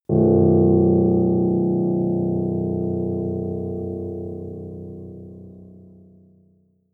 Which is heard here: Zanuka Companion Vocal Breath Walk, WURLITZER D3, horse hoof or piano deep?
piano deep